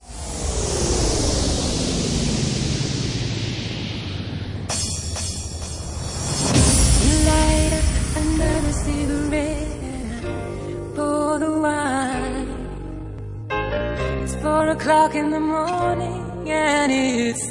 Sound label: Bassline House Organ Mix; Bassline House at 130 bpm